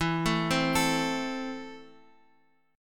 E Suspended 4th Sharp 5th E, down, Guitar, Open F; Esus4#5 arpeggiated

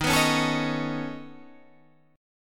Db 7th Suspended 2nd Sharp 5th Eb, chord shape

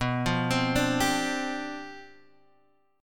G 11th B

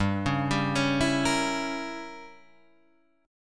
G Diminished 7th, Guitar, Open G

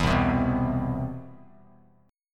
C Major 7th, Bass Standard; CM7 strummed

